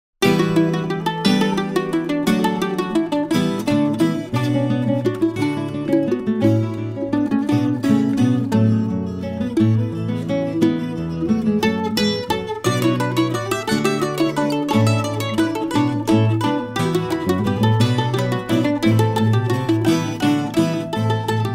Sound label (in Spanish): Courante (Pasillo)